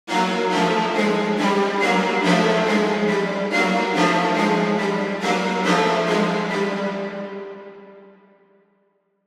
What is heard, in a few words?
Intense Strings Mid, horror strings